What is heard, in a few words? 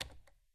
type; NotDotTeam